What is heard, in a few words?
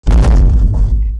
Explosion